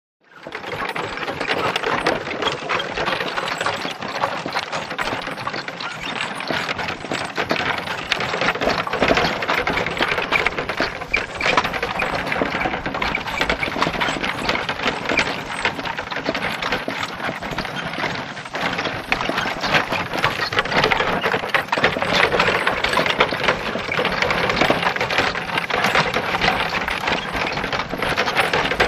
Away Pony Cart Start A, carriages

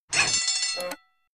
Alarmed Robot Alarm, servos

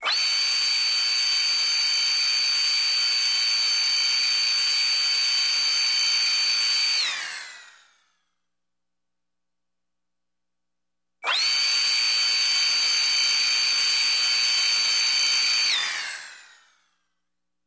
Bone Saw High Tech; hospital & lab equip

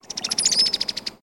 Brown Headed Cowbird

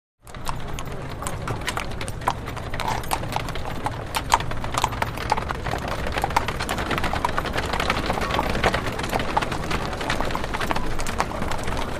Carriage Up Constant, carriages

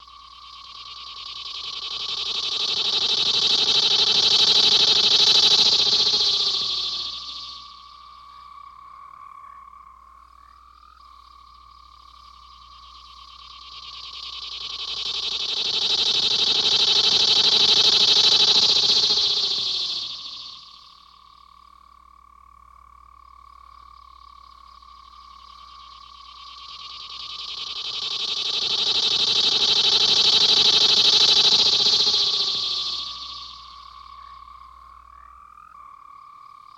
Cicada Bird Jungle, cicadas

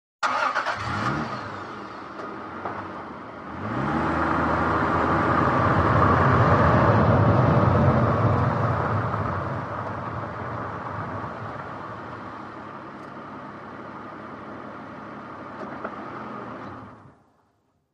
SUV Engine Accele

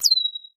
laser; lasers

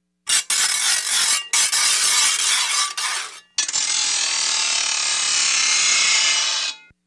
Metal Grinds Slidin, grinders

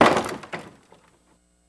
Gate Slam Open Hit

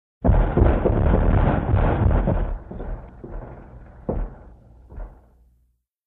Shell Whir Dist Explo, modern artillery exterior

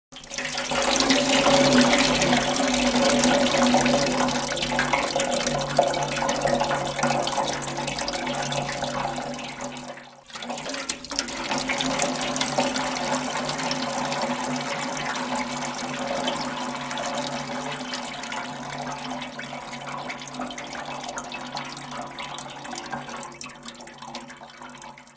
Water A Metal Trough, pouring

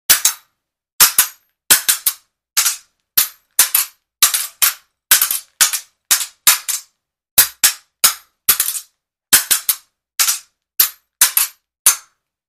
Foil Sword Fighting, swords

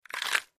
Bite Vegetable Peppe

